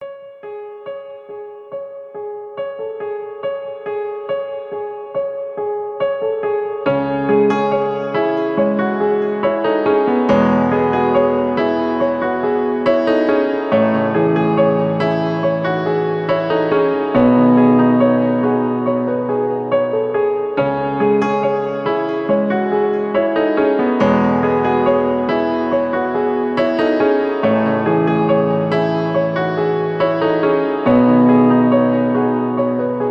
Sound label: royal piano